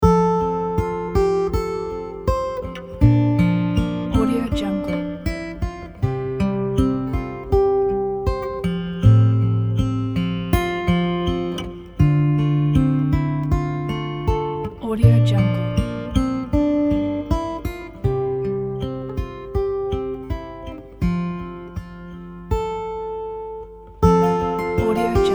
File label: natural acoustic guitar